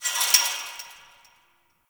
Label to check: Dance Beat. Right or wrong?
Wrong